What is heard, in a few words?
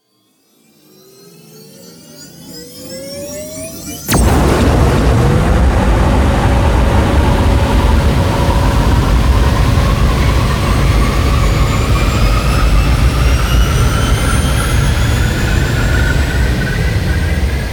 beacon launch, ships movement